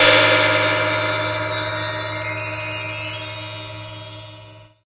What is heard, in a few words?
Gong Brush Strike mix wind chime